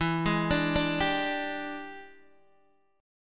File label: E 7th Sharp 9th Guitar Open D, chord